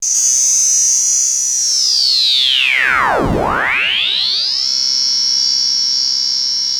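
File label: FX right, attacks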